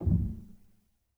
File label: rel, Grand Piano; bitKlavier Grand; Piano Bar